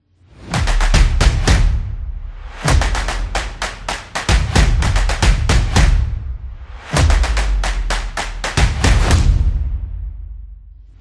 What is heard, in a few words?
This Is Percussion Beat